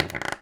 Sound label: Palette Squeak, Wooden Palette